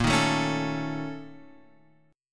A sharp Minor Major 7th Double Flat 5th A sharp Guitar Open F; A#mM7bb5 strummed; JGuitar